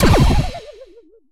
Jingle Failed Experiment; StreetPass Mii Plaza; Feed Mii; 3DS

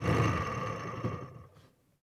vehicle small boat turn off

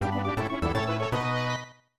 Birdie; Harukanaru Augusta 3; SNES

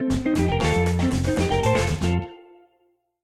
success; Cook Serve Delicious

